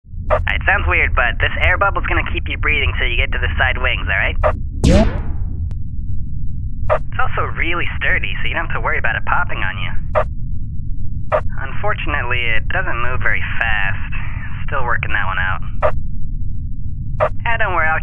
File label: Air cannon; The Henry Stickmin Collection